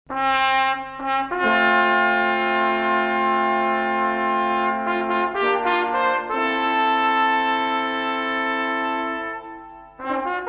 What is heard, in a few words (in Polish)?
hejnal